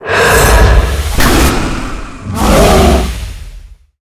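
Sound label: boss spawn, monster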